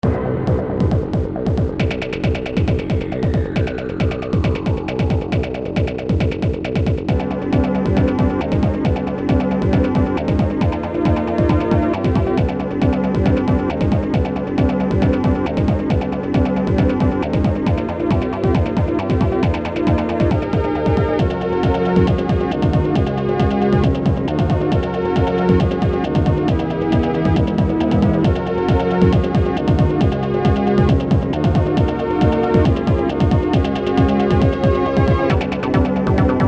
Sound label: MENU MUSIC; BSPHERE